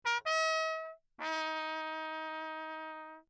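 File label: trumpet mute signal; Perinétventiltrompete; Lechner; JoRal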